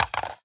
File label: phys mag drop ar poly concrete, magazine drops